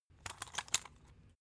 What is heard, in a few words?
salt mill metallic slow; gastronomie; soundpool